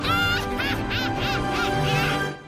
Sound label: deku is laughing; bnha